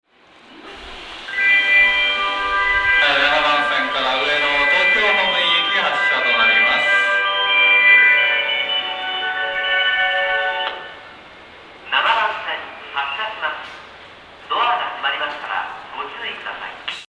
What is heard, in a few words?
ikebukuro melody, Tokyo Train Tunes; japan